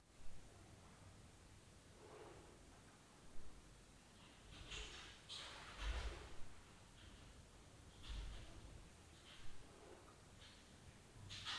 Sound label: DOOR NOISE; Night Noise